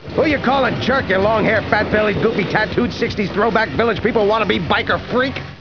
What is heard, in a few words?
biker